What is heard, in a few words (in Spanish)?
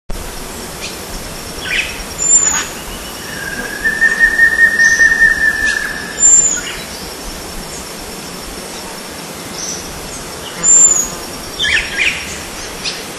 Tangara preciosa y Batara cinerea; PP Cruce Caballero